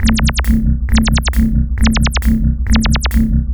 repeat beat

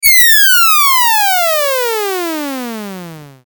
falling, saw; sfxr

